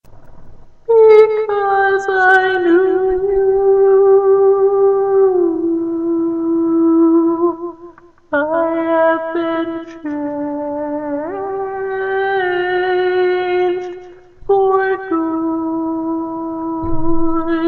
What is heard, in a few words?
Tenor, barbershop tag